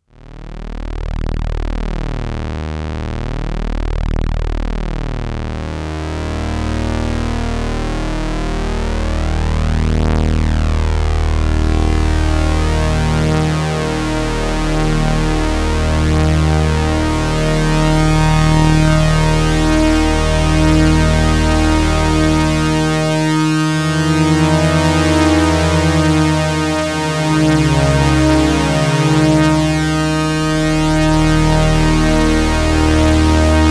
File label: FOUR voice; OBSX